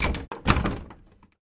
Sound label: Padlock Metal Hooks